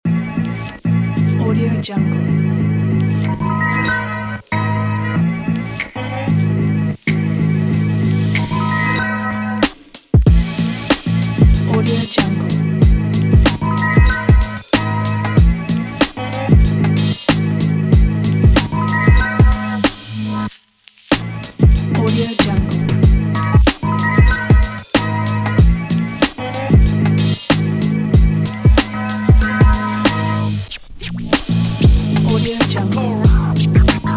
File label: hiphop beat